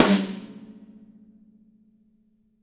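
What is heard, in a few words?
Jazz kit snare drum, Drums Acoustic; AKAI; JAZZKIT 2; 90s sample CD; USB Soundscan vol.10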